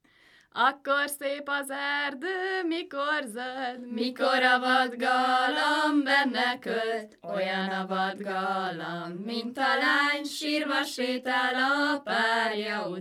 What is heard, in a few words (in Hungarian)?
nepdal; akkor szép az erdő mikor zöld